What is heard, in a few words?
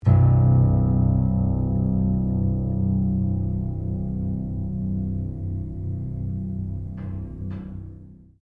Piano Upright Broken Pluck, Upright piano without keyboard; Pianos; Musical